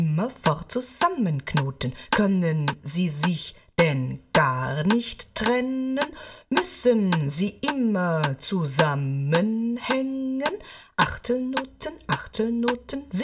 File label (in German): Sprechkanon einstimmig; Der Rhythmus-Globetrotter